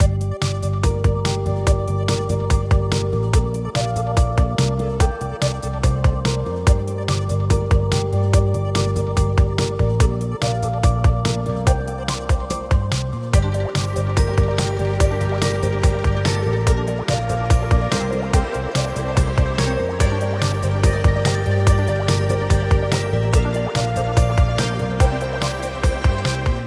Re Spin Music Loop; Romanov Riches

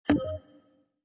reel stop special; Rabcat; Classic 243

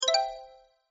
bar filled; Skillzgaming; fruit Blast 92 Desktop

